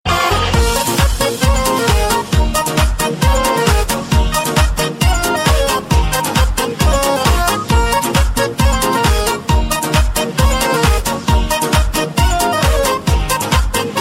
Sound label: Rascal Ringtone